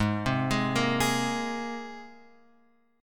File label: Ab Major Add 9th Ab down Guitar Open F; Abadd9 arpeggiated; JGuitar